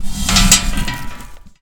Metal Hollow Bin Noise

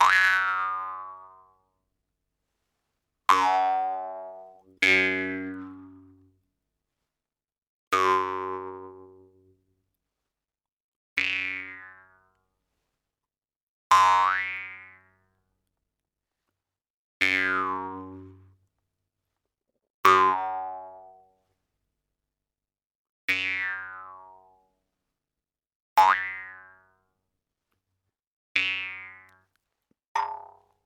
Jews Harp

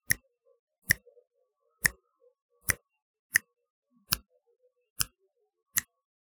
Finger Snaps

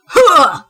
"Huah"

